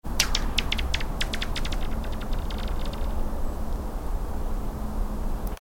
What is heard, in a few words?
slipping ice sound